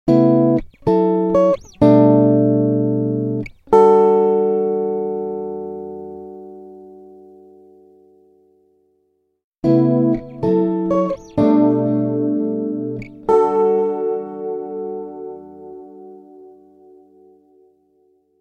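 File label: Electric Guitar Finger Pick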